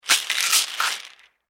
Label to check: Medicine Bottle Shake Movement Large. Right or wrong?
Right